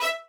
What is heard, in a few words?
violin